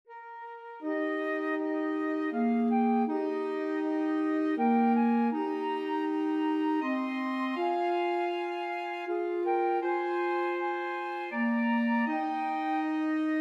away in a manger, flexible mixed ensemble 3 players